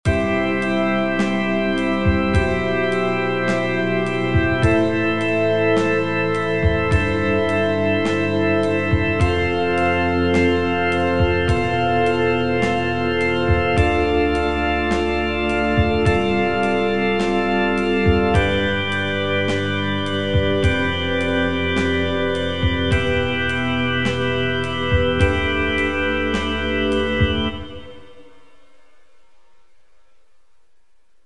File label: cartwheel organ